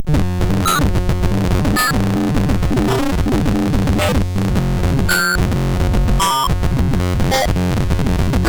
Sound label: Grungy, MOS6581R2